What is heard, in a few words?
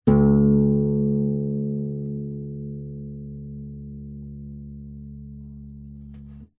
guitar nylon lower string pick stop finger